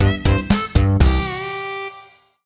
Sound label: Losing a level